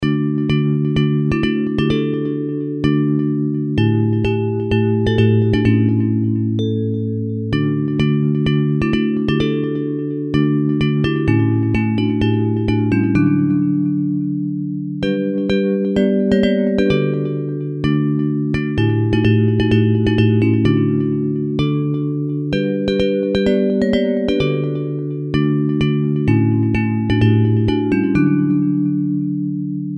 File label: Shall We Gather at the River Bells; Hymns; Praise